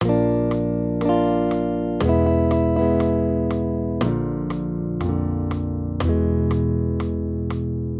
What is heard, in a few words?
maj triads; Bb-E; accompaniment